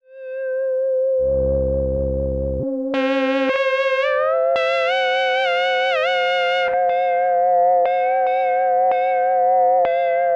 weird clown worst

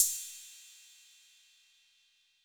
Cymbal 808 Color

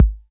BD 808 Mid Color

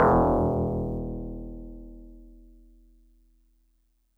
Tubular Bells DX100 E1

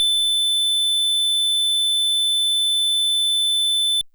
FM Sq Dist DX100 A6; square

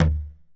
Tom Acoustic Crush Lo Chorus

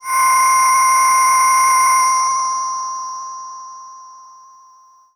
Breath V DX100 C5

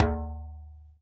Djembe Slide

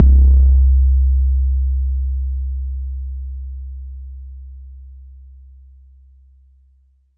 BD; TRX

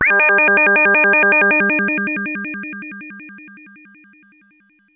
Synth, UFO wavez

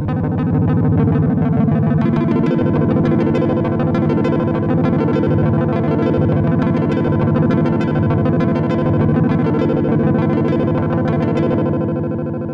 ARPEGGIO; Chanchin Arpz

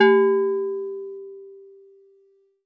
Steel Drums DX100 G3

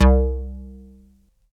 Jupiter4 Unison Pluck Vinyl Synths C1, bass

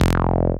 F Aciiid

